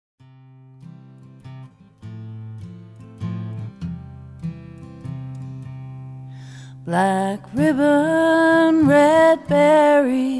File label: mourning song